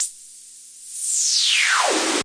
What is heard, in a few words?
Yamaha CS5, Noise Sweeps